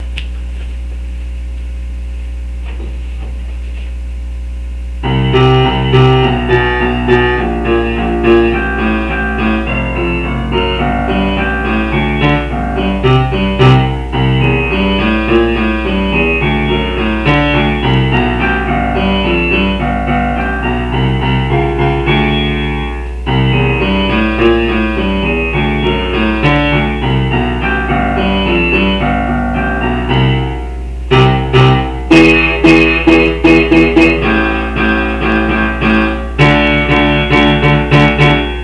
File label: Bass; SMB2